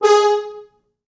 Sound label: 4 FrHorns; G#3; East West; Quantum Leap Horns Sax; 4 FrHorns MOD